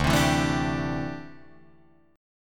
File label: D 9th Suspended 4th D down Guitar Drop D